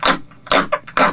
grunty, headcrab poison; hl2

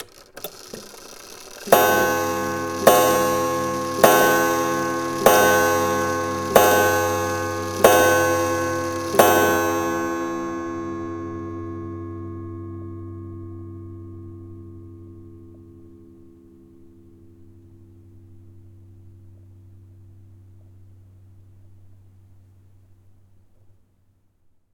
Mechanism, Antique Clock Mechanism; Sonic Bat

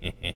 hehheh; mcop; trainyard; hl2

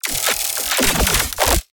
Reload Compact, Sci-Fi Energy Weapons; Rescopic Sound

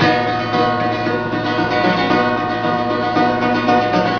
tv music; hl2

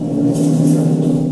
wall bit, ambient; hl2; kraken

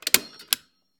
Staple Gun; UberDuo; The Workshop Audio Playset

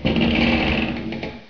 creak; hl2

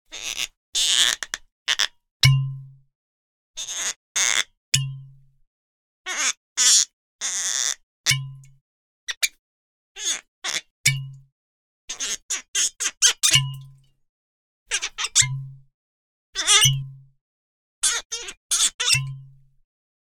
CARTOON - squeaks with bottle pops; Game Audio Factory; GAFSFX02 - Cartoon Materials

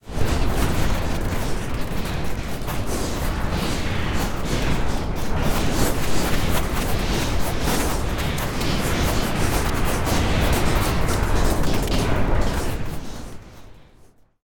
Air Soundscapes Broken, Magic Sound FX; David Dumais Audio; Magic Sound FX Pack 2

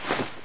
cloth; hl2

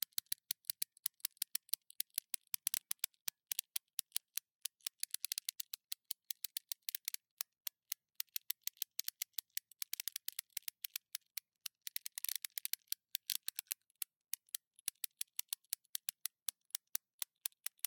small gear plastic metal slow; Mindful Audio; Elements - Gears

